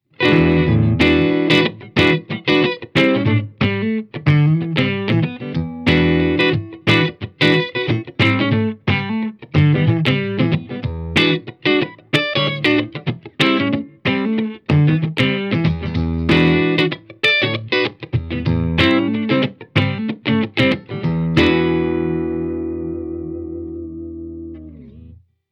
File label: Blackstar St. James Comb DI Stereo; Architect Software